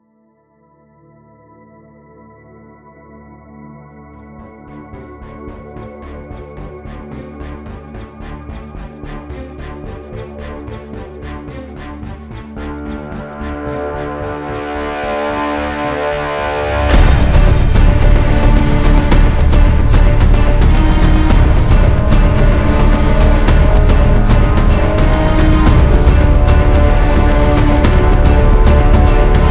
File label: soundtracks